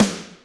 Snr Cmb Plate, Alesis D4 drum machine

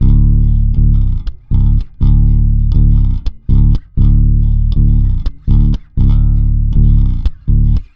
Rock, Bass; Bass X

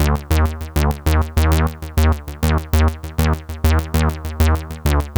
XPANDER; AMG - Vince Clarke Lucky Bastard

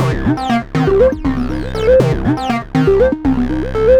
xtra acid loop; Sonic Foundry (Sony Creative Software); Ugly Remnants VOL-2

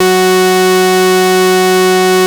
MC 202, BASSES

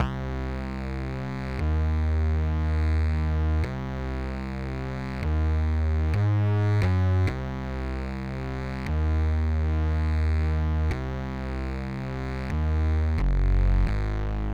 Bass Riff C Eb Ab Gb, 132bpm; Big Fish Audio; Marshmellow; Construction Kits

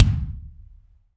BD AMBIENT, Drums; Real Mega Drums

